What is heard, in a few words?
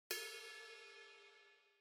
ride dome